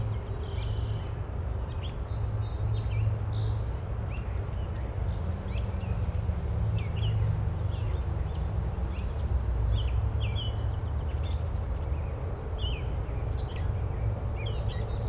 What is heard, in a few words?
ambience, minigolf